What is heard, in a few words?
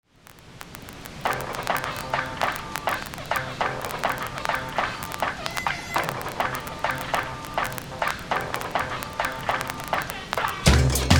Beat Freak FX Pitch